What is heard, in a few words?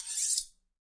Knife